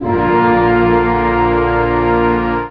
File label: ORC G, ORCHESTRA; Best Service ProSamples vol.55 - Retro Sampler; AKAI; ORCHESTRA 2